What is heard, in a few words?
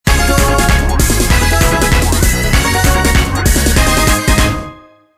Jingle, Mario team jingle